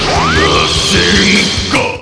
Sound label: masenko; db mod 15; piccolo